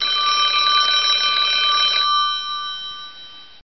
Phone Ring

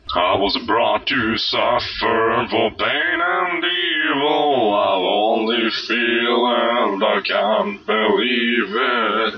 wobbly brought to suffer for pain, MALE SINGING

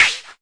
mbrute sn, Arturia MiniBrute

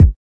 kik

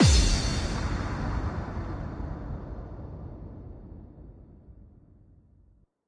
drop kick, punches hits discoblasts; single hits

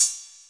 cym, Roland CR-8000; DRUM MACHINES

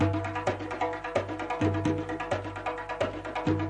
130 middle east tribal

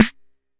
Jomox MBrane, drum machines; Jomox MBrane 11

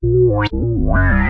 sweepin filter bass

